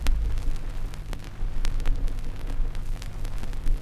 Crackle, Vinyl Noise

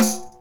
BASIC ENSMBL; OMI - Universe of Sounds

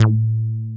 HOUSE BASS; Masterbits; Dance Trax

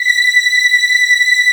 RICH VOX B5, RICH VOICE; AKAI S-Series CD-ROM Sound Library VOL-7

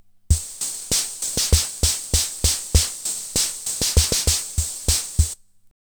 ROCK, Eminent Solina Rhitmix

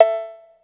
808 Cowbell, Ensoniq ASR-X Pro

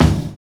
60 S BEAT; Univers Sons - Kitch 70s

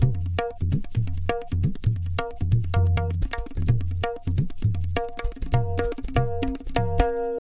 Tabla, 130 BPM; Zero-G; Pure Tabla

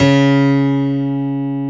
PIANO C#3; Techno Trance; E-MU Formula 4000 Series Vol. 2

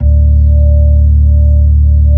B-3, Brite; Brite Xfade

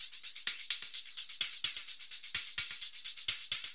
TAMB; Zero-G; Chemical Beats

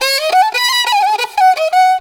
riti grooves; Best Service; World Colours

